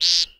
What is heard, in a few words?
american woodcock, bird calls